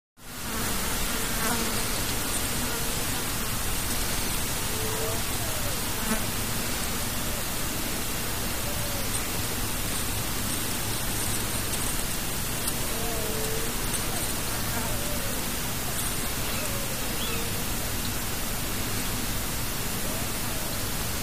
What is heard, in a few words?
Plains Light Open, insects